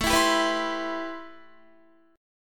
A-Minor 9th A Guitar; Am9; strummed

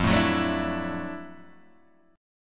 D Major Add 9th F Guitar Standard, chord; strummed; JGuitar

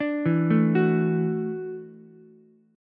E 9th Suspended 4th E down Ukulele C6; E9sus4 arpeggiated; JGuitar

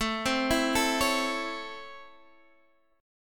Db Minor Major 7th Sharp 5th A

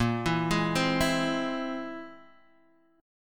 C Augmented 7th A sharp

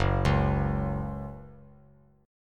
F sharp 5th F sharp; arpeggiated; JGuitar

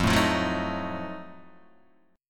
F 7th Flat 9th, Guitar Open D

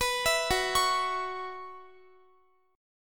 Gb 7th Suspended 4th Gb down Guitar, chord

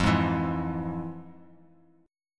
F Minor Major 7th, chord; FmM7 strummed; JGuitar

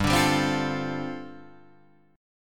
G 7th Suspended 2nd Suspended 4th G chord

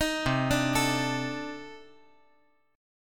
C Minor 6th Add 9th C

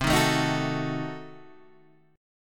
C Diminished 7th C down Guitar Open G

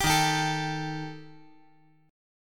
D sharp Major Flat 5th; strummed; D#Mb5; 12,15,15,12; JGuitar

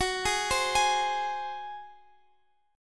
G sharp 7th F sharp

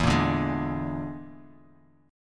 E 7th Flat 5th, Guitar, Open F; strummed; chord; JGuitar

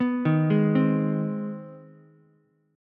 C Minor Major 7th Sharp 5th, Ukulele chord; arpeggiated; JGuitar

